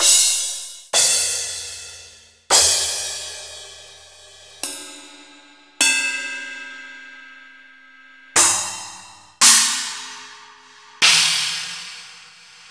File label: Yamaha SU700 Cymbals